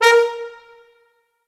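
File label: Orchestral Brass, A sharp 3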